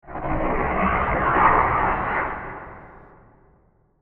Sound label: Scary Creature Transition Creepy; Scary Soundscape; Horror; Scary Soundscapes; CraftedSignals